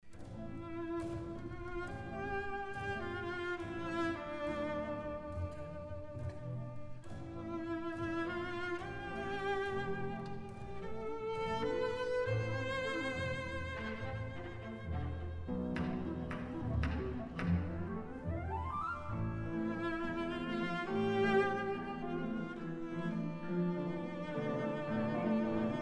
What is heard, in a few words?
CELLO CONCERTO